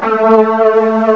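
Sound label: flanger guitar; GUITARS; M8; CMI Factory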